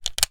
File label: household sunglasses plastic temple movement; zapsplat